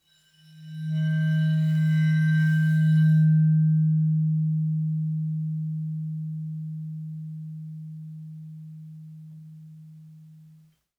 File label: Vibraphone bow Eb3 stereo; MIS Pitches - 2014; Percussion